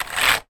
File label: office keyboard apple hand swipe across; sound effects one